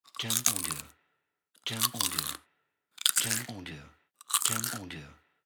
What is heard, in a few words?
Crunchy Chips